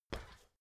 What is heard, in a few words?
step ladder metal single